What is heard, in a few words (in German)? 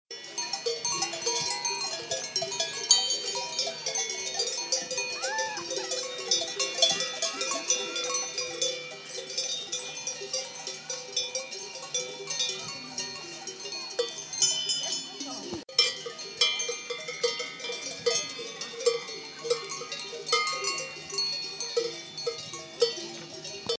Kuhglocken auf der Alm